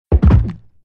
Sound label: hit Body; RadwinIsland